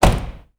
Car Door Close; Automobiles

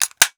Stapler; Office Supplies

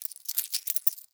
Coins Sorted

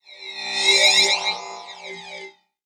Radio Hit Digital Bull Horn; Transitional Elements; 1001 Sound Effects

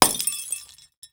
Lightbulb Break, Crashes

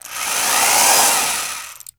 Electric Drill; Tools

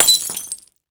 Glass Break, Crashes

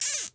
Cable Tie; Tools

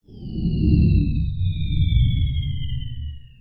Shuttle Fly By, Sci Fi

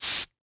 Hydraulics; Motors & Switches

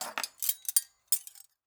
Silverware Removed from Table, Kitchen

